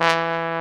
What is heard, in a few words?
TROM BONE; AKAI S-Series CD-ROM Sound Library VOL-2; ORCH